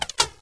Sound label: menu Enter; Gem Mine